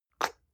gel dispenser air suck in; haus garten

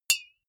deodorant hit hard, metall; maschinen; soundpool

